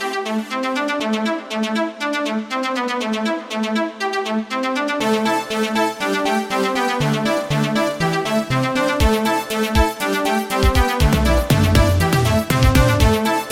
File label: 80s Synthwave